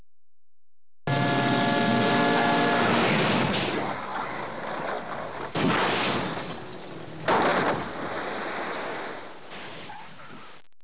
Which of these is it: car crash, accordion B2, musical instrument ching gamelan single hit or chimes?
car crash